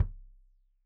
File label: Kik Stereo; Deeply Sampled Drums; SMDrum